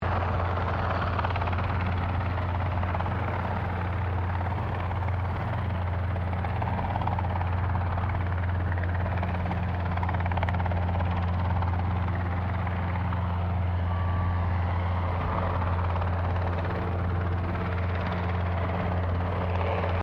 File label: HELICOPTER ROBINSON R22 MANEUAVERING LOOP; Various commercial helicopters; Helicopters (Sound Effects:Vehicles)